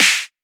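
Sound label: TR909 Snare, Roland SH-32 Drumset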